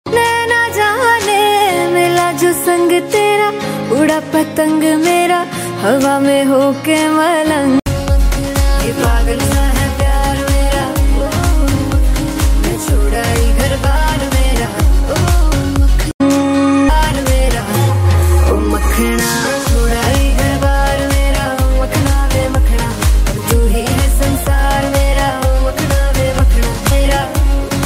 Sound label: song ringtone; sushant; bollywood